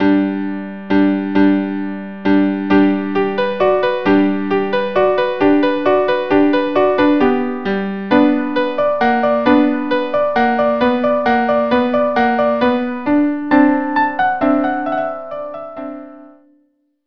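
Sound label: violin viola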